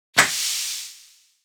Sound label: City bus air hydraulic suspension air release